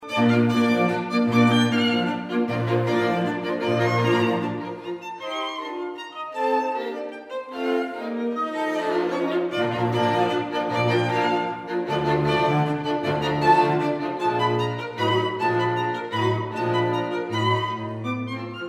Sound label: waltz; kiel